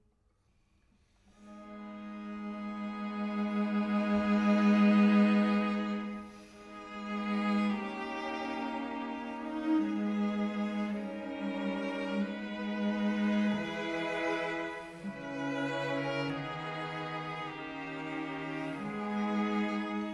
String Quartet in C-Sharp Minor, Adagio; Beethoven; No. 14; Op. 131 VI.

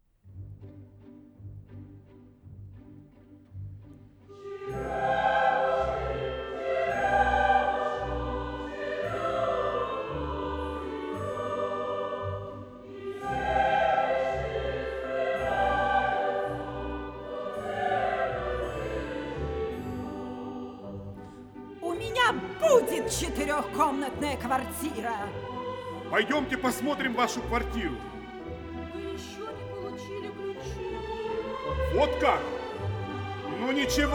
Allegro; Шостакович Соч.39. Светлый ручей

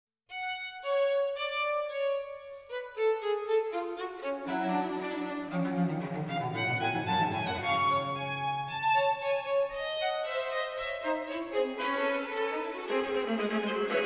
Concerto for Strings in G Minor Allegro molto

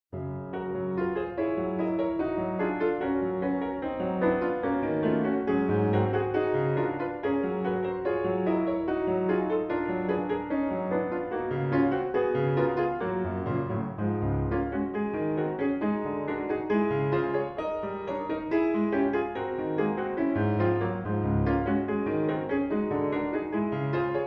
Prelude in D Major, Andante Serioso

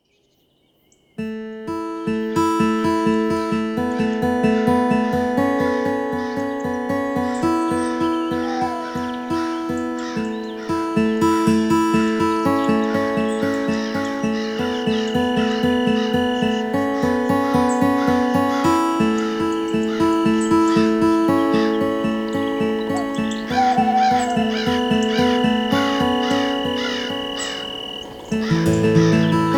Guitar Sounds on Forest Wind

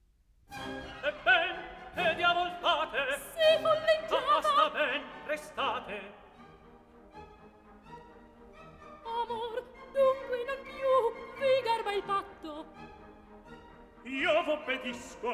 Staatsorchester, Verdi; Verdi La traviata

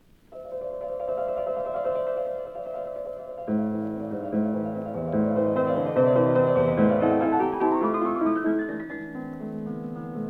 String Quartet in E Minor, Andante; Krettly Quartet; Op. 121 II.

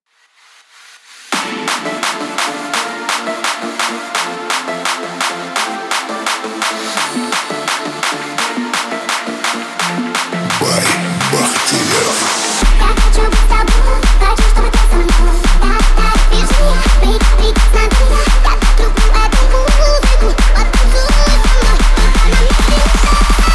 Low Bass 31-35 Hz

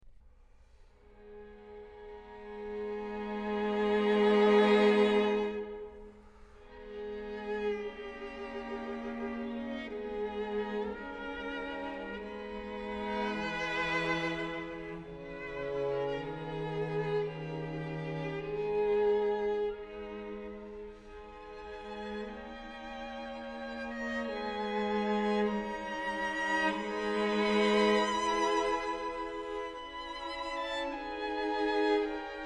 String Quartet No. 14 in C-Sharp Minor; Beethoven; Op. 131

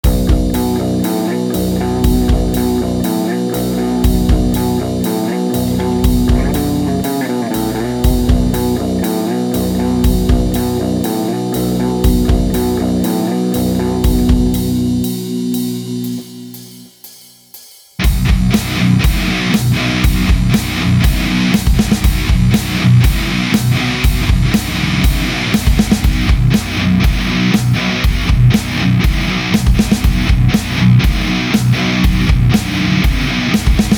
Guitar Cover

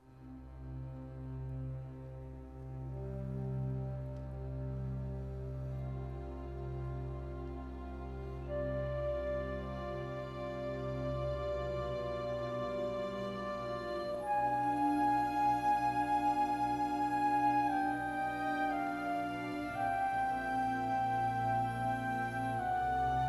Concerto No. 2 in G Minor, Chamber Orchestra; La Notte, F. VI-13, RV 439

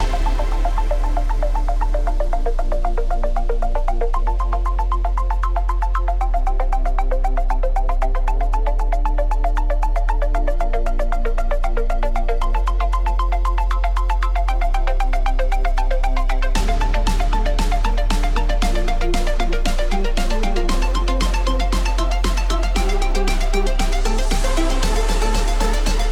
Techno Twilight

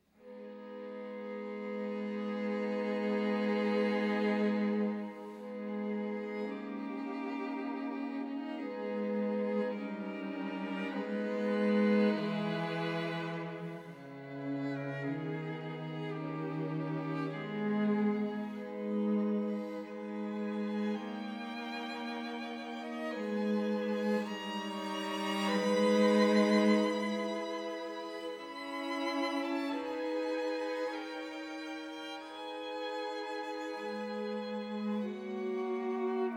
String Quartet; No. 14 in C-Sharp

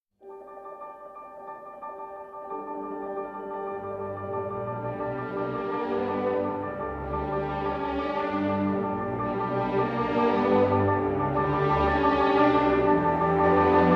French Overture in B Minor Courante

